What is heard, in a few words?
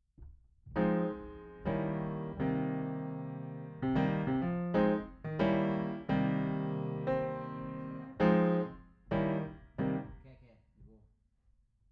Piano Mono